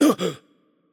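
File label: Japanese hurt light; ja JP; VO; bloom minigame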